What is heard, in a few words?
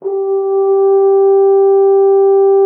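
French horn G3; Xsample VOL-3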